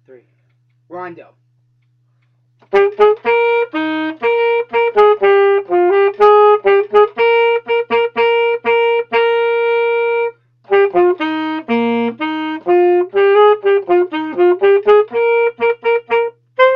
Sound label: Saxophone